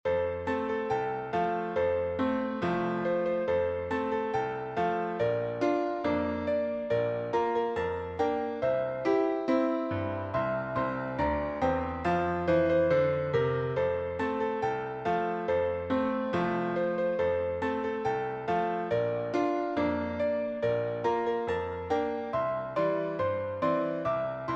jingle bells; pierpont james

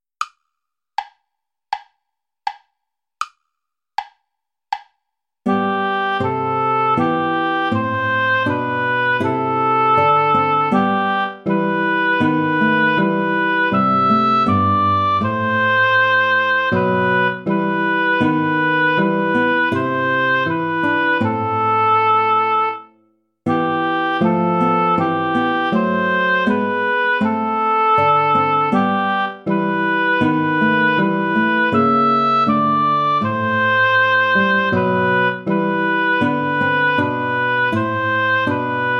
play along without guitar; reger max; der mond ist aufgegangen

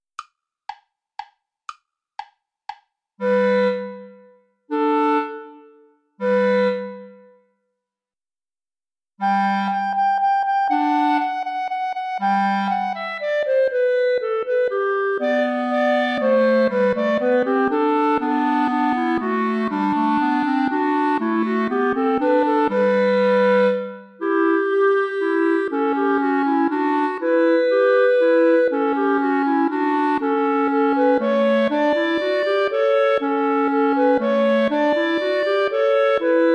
play along without clarinet; alter ungarischer tanz

